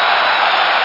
long crowd

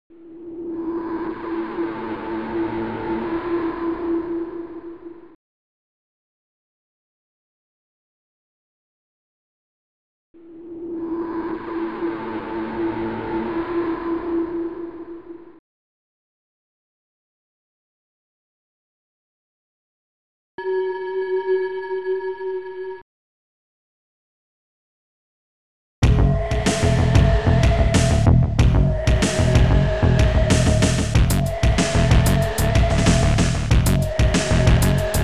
spooky

